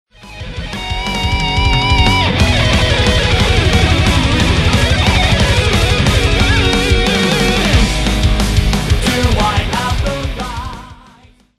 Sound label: shred